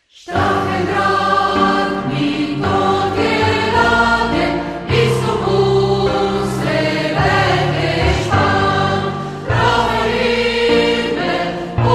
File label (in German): Dachau-Lied